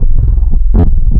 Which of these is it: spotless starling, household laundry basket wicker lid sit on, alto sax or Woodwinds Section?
alto sax